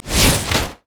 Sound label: qspellbounce; LoL; ziggs snowday